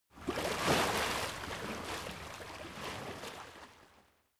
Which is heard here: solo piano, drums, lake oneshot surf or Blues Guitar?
lake oneshot surf